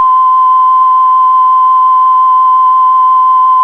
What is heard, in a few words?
carrier; balloons and static electricity